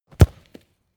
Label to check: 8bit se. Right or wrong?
Wrong